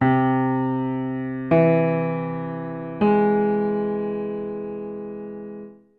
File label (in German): ueberm arp